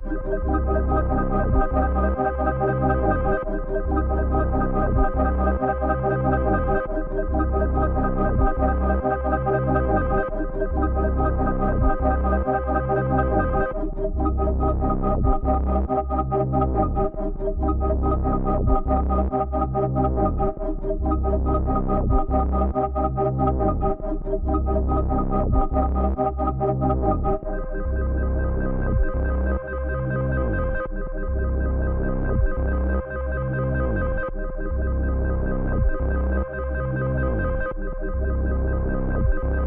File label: 140 BPM C Min, Trap Melody Loops; Spaced Out; Cymatics; Nightmares Trap Melody Loops